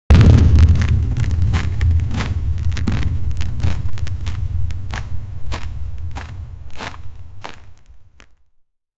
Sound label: a series of three heavy, snow crunch